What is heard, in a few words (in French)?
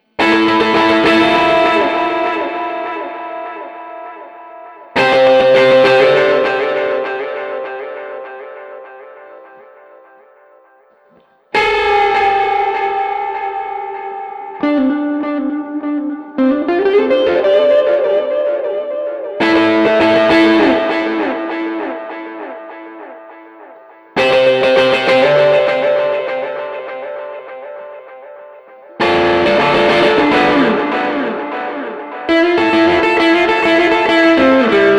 enquete de tous les jours guitar